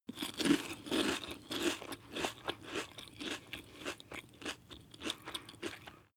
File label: chew biscuit